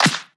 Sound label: Claps & Snares